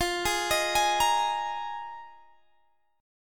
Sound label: D sharp Suspended 2nd Suspended 4th F